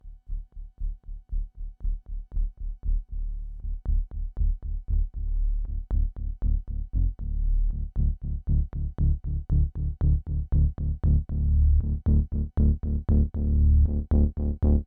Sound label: click bass